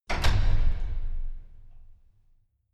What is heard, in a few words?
closing church door